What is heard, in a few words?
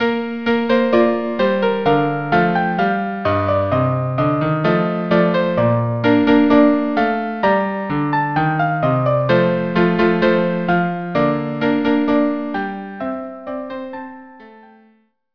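violin cello; Rinaldo march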